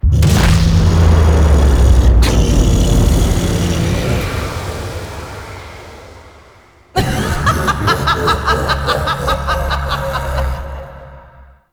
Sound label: Octopede Phase Transition Stinger; Warframe; Duviri Murmur; Octopede Encounter